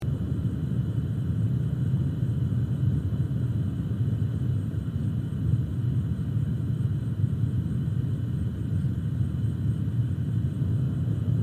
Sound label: Gas stove burner flame loop; cooking